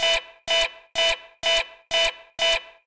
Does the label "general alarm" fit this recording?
Yes